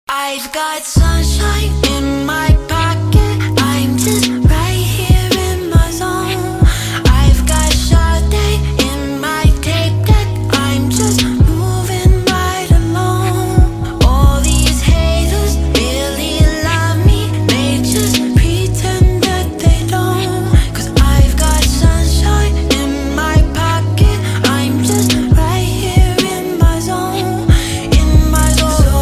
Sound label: Jazz